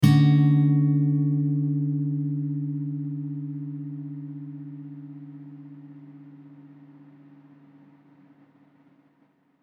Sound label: A 100 Mellow Acoustic GTR